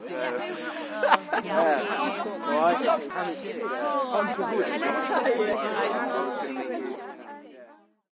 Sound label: Party